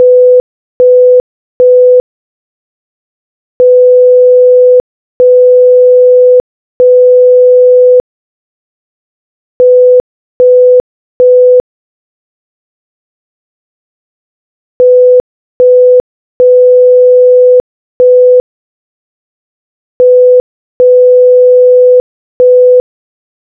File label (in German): Morsecode